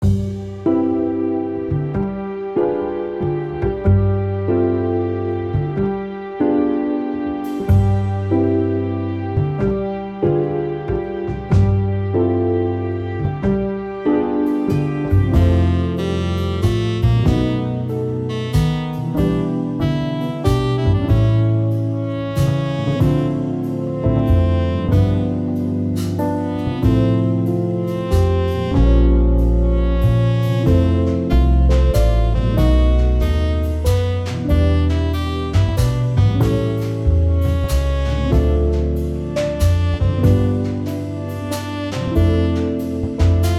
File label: Jazz; Jazz Music Pack Vol. 2